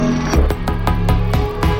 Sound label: HELMET IN